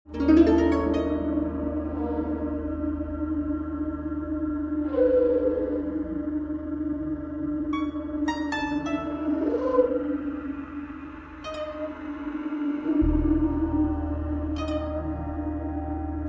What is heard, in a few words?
Bamboo